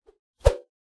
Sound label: melee attack swoosh light; weapon swooshes; psfx